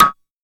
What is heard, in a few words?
Click one shot, SFX; Sonic Foundry (Sony Creative Software); Electronic Point Blank